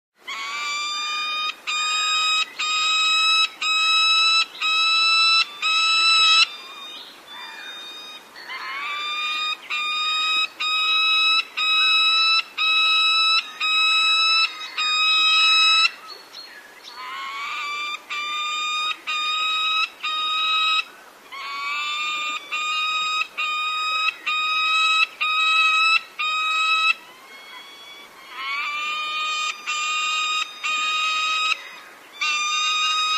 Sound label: Peregrine Falcon Call Ringtone